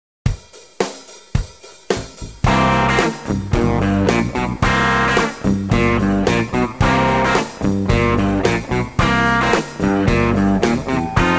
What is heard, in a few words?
Rhythm Guitar